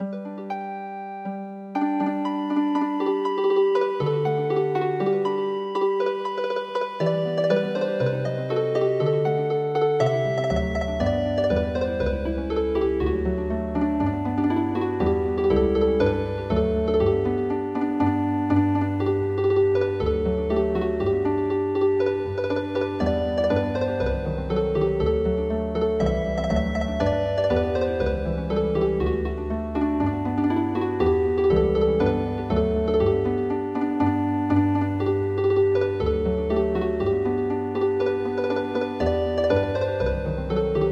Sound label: Healing Harp